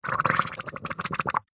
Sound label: cartoon slime bubble up; zapsplat